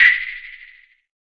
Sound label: SAND, Drums; Distored Reality 1&2